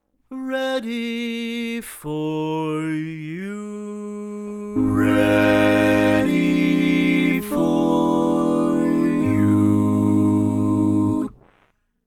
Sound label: Lead, barbershop tags